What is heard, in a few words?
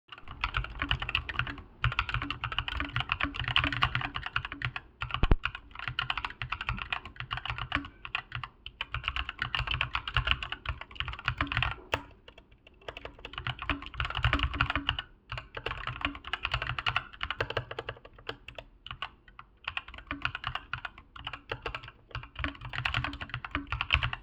ASUS ROG Strix Flare II Animate typing sound